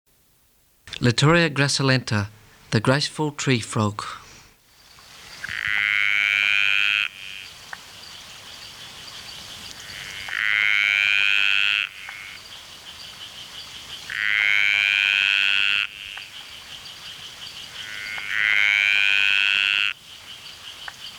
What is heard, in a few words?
Litoria gracilenta